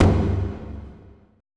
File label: TOTEM, ETH DRUMS